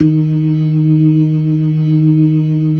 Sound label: LO MAN V R, ORGAN LOWER MANUAL